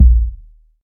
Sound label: fluffy steel kick drum sample a sharp key